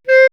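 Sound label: Bass Clarinet C5; dsp2008 sound library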